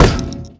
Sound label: glass run